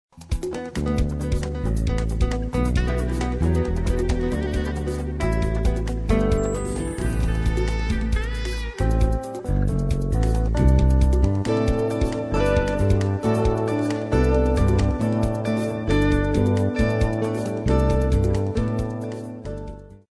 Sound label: newage